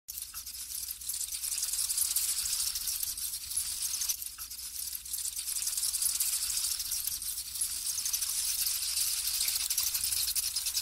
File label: damselflies; سنجاقک